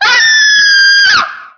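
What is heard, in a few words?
Civilian Ahhhhhh, female; Army Men; Civilians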